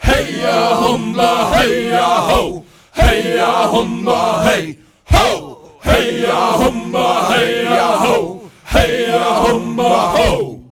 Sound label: VOX VOX; Sound & Vision - Gigapack II CD 2